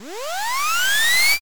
riser

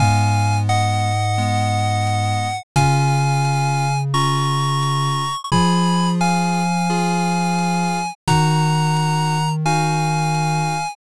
bgm mellow

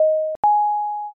intercom

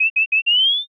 violin fear

